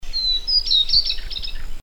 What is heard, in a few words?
White browed Shortwing; Brachypteryx montana; song; Bird Call Recordings